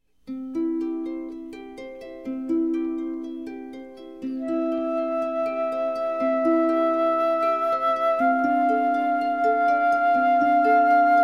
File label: harp